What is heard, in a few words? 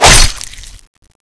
knife hit; weapons; cstrike